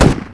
powered shot

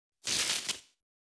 electric spark

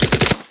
inf gun

